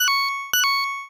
health warning; action RPG game; Adventures in Lestoria; Sig Productions

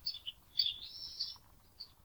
birds; Adventures in Lestoria; action RPG game; Sig Productions

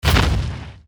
archer skill siege stance foot; archer skill siegestance 01

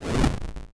dig; action RPG game; Adventures in Lestoria; Sig Productions

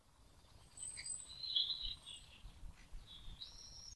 birds; Adventures in Lestoria; action RPG game; Sig Productions

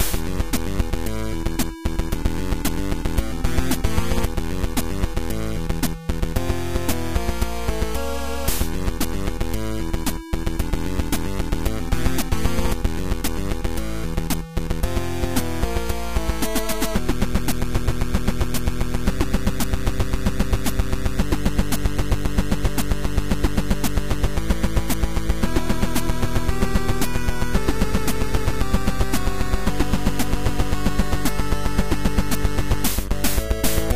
Boss Battle; hamster; nene

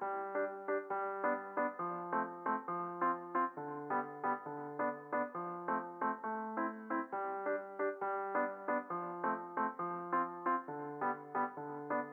piano; Adventures in Lestoria; piano 2; action RPG game; Sig Productions

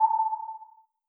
sonar; Seasons-Of-Loneliness

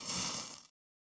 wizard auto; Adventures in Lestoria; action RPG game; Sig Productions

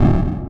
shockwave; Adventures in Lestoria

